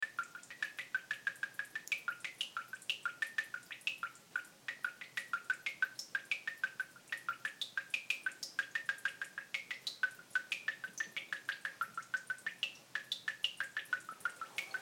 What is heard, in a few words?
piano